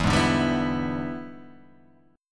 E 7th Sharp 9th, chord

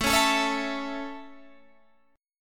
A Major A

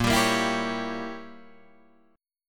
A sharp Diminished 7th Guitar Drop D

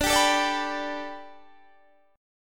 D Suspended 6th D, guitar

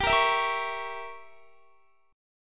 G Diminished 7th, Guitar; Gdim7 strummed; x,22,20,21,22,20

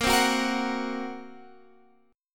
A sharp Suspended 2nd Sharp 5th A sharp

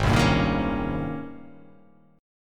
Ab Major 11th Ab; AbM11 strummed; JGuitar

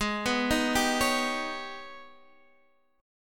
G sharp Minor Major 11th, Guitar Open D; x,11,9,9,10,11

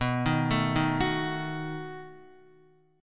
B 7th B down Guitar; B7 arpeggiated; JGuitar

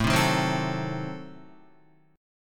A Diminished 7th A down Guitar

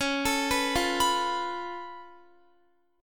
Db Augmented 7th Db down Guitar; Db+7; arpeggiated

